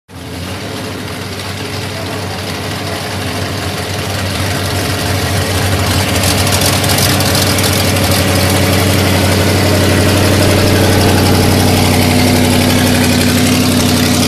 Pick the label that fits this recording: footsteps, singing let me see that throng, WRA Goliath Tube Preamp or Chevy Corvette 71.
Chevy Corvette 71